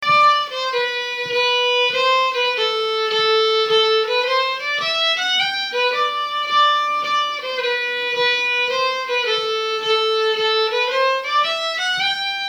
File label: mazurka